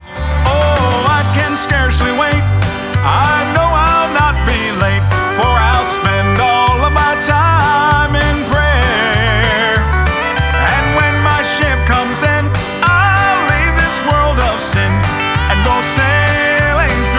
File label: Gospel